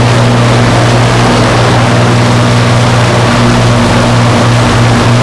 avro lancaster rear, engines; War Thunder

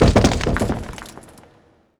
medium rock impact

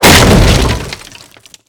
Projectile Sounds; Red Orchestra Ostfront 41-45

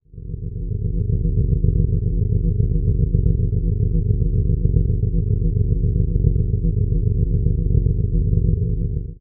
ship engines; World Of Warships

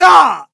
pain

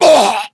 death

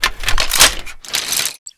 reload clip in, foley; m249

